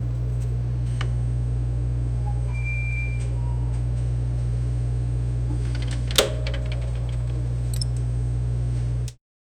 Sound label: realistic sound of openin, store